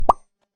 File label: swap; Unity; Farmhouse Hustle